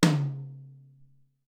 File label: 10 Tom, Alesis SR-18